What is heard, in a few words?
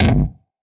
gas kick